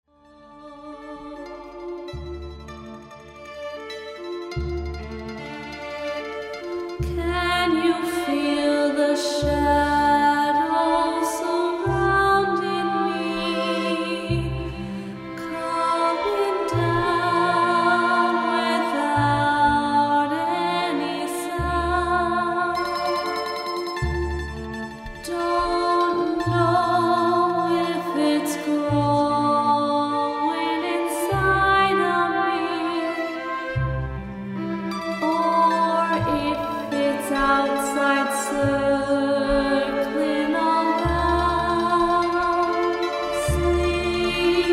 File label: Lullaby